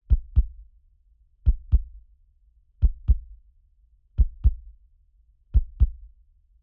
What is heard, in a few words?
calm heartbeat